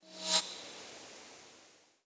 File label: blow